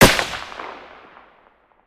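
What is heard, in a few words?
weapon ar2 suppressed fire player atmo exterior; gmod; viper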